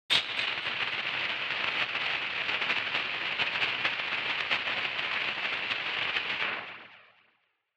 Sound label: sparkler